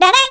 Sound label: Miniblin Poke